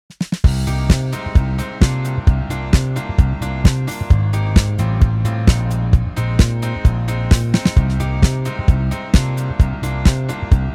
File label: Disco bass; EZbass MIDI; Classic Disco